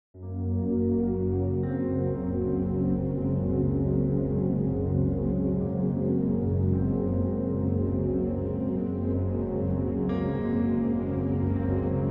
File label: Piano